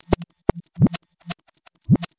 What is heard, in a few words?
Revbeat